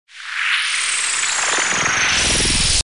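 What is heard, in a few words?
magic game skills sound effects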